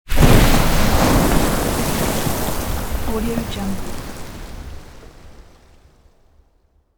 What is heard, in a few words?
matter mayhem debris small blowup heap of earth mid; soundmorph